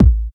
boomy kick one shot A key, kickdrum sound tuned to the A note; kick drum samples